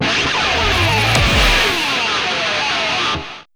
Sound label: Enemy Wail